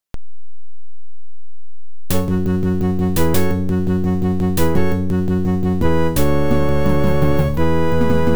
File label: Ad Lib; 4D Sports Boxing (Mindscape)